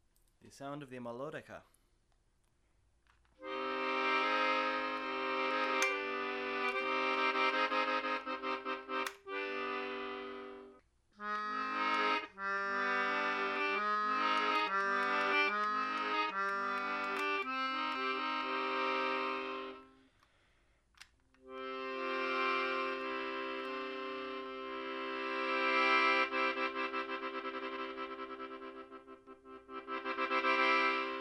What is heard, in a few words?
melodica